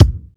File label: clear kick drum single shot d key